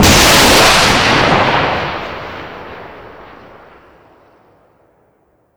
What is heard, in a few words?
Tow Launch; Weapons; DCS